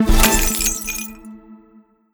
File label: small Win; netent